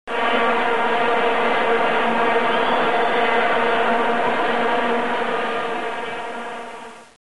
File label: vuvuzela